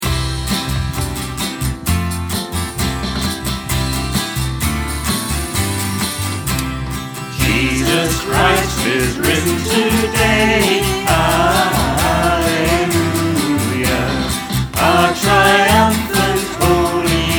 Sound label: choir; Jesus Christ is risen today